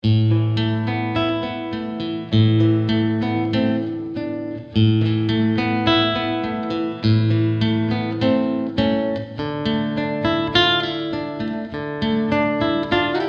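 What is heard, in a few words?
Blues song, Chitarra sola; Guitar Club Marzo 2025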